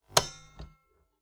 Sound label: Typewriter